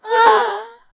female anime death moan

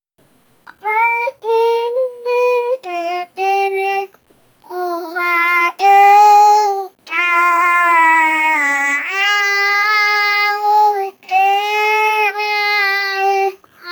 alien creature is friendl, greeting

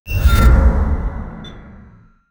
futuristic sci, intelligent

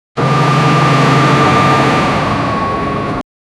dumper truck sound loadin, construction

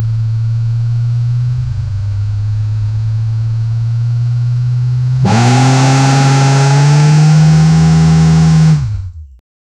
aggressive acceleration, performance

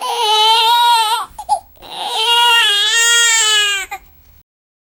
one baby crying in tantru, baby

